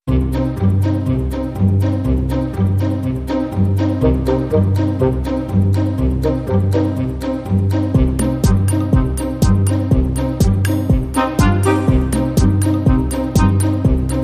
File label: Modern Percussion Full